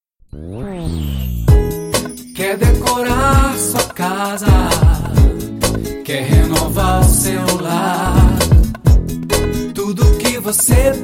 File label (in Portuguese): jingles